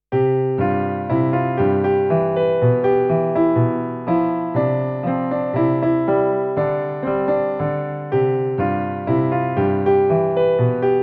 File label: piano; moviestar